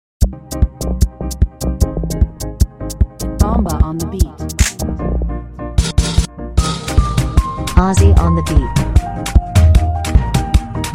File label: Groove Dance Beat